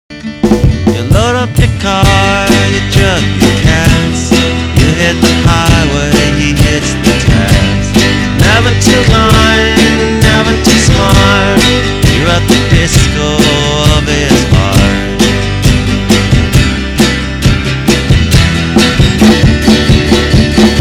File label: Funk; Signature Car Funk